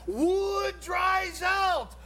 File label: wood